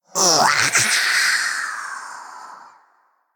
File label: Female Drakkari Troll Death